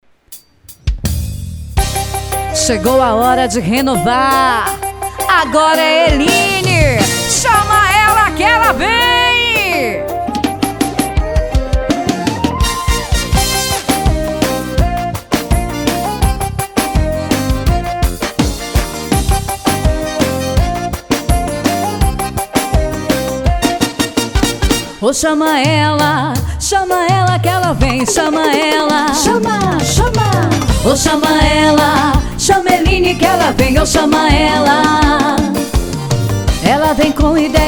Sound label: Jingle